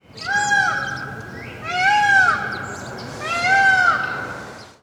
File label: Peacocks Singing; SES Animals SFX